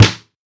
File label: solid acoustic snare sample a sharp key; snare drum samples